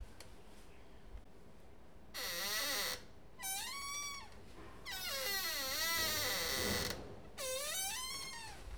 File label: door